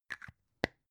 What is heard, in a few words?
plastic spray can small close slow